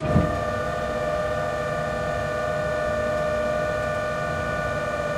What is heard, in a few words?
Gear Electric Tail Loop, Aircrafts; DCS